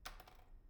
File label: pendrop; IEEE AASP